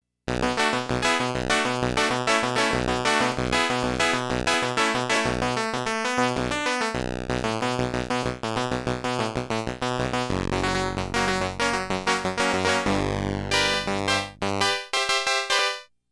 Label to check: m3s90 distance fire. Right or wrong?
Wrong